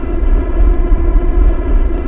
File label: fast, engine; Quantum Redshift; XBOX; marcus